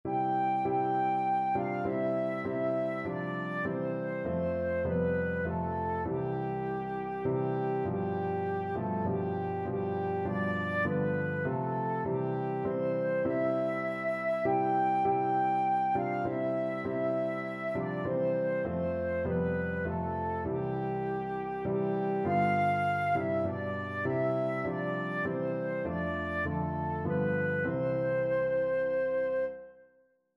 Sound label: away in a manger flute